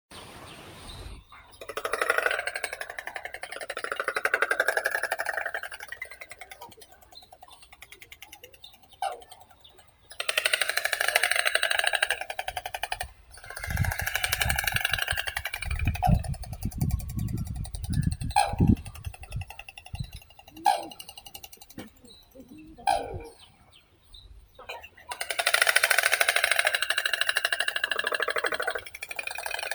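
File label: bird voices, black-billed stork, Ciconia boyciana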